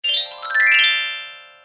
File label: magic chime